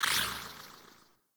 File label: Weapon Hide; Weapon Visibility; Warframe; Characters; Tenno